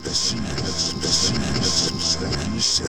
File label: Fx Vox; Equipped Music; Loungin House